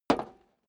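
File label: step boots metal dry single right, footsteps; schritte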